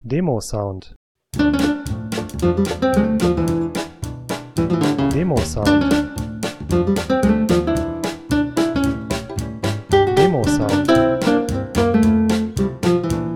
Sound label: Soundmodul Guitar